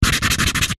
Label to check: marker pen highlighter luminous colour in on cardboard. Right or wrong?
Right